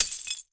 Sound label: Crashes Glass Impact; AdobeCC